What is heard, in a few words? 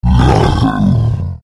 Bear